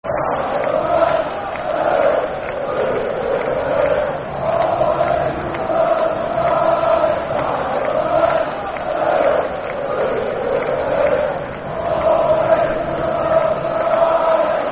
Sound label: Football Chant, Soccer Song, Scottish; Scotland